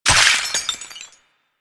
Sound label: Loot Crate Burst; Ambience; Entrati; Warframe; Infested Eris